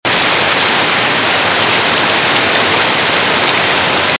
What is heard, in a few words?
rain hard